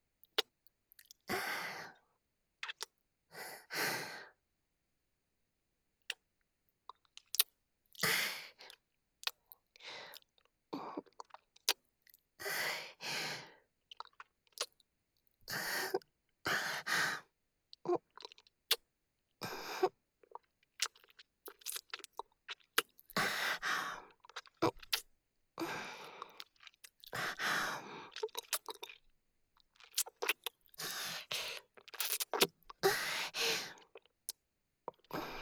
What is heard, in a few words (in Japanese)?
キス(接吻)